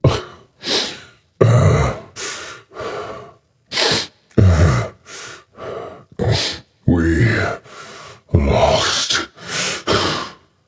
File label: boohoo; smite; osiris